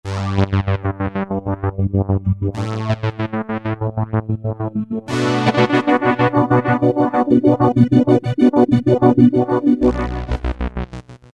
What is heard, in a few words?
lfo-Praise; E-mu Orbit V2; Factory presets